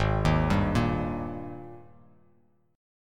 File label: G sharp Major 7th Suspended 2nd; 4,6,5,3; JGuitar